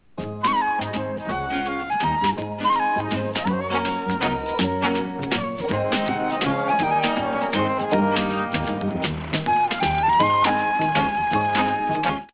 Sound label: flute music intro; bradybunch